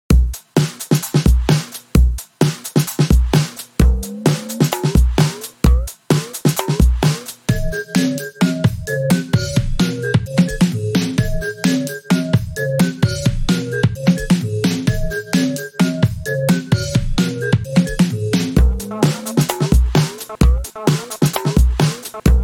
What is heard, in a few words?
guitar lead